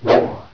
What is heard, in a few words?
sword, weapons